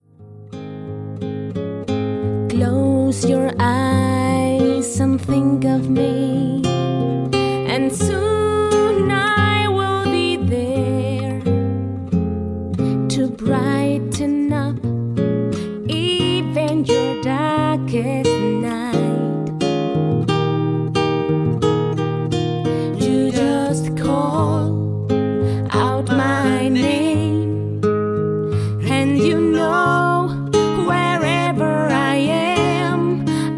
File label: Gesang song